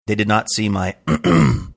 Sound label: cleared throat